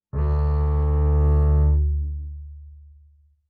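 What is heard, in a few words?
Bass arco fortissimo sul E E1, Double Bass; MIS Pitches - 2014; Strings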